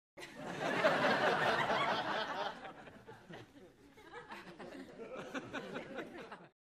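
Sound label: Crowd 100 Light Burst; medium int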